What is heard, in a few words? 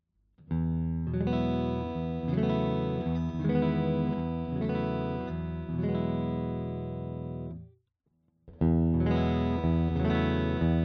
Cort MBM 2H clean All positions Chords